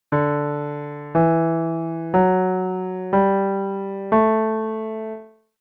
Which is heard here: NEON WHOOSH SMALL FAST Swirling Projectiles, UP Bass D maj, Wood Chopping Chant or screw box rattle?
UP Bass D maj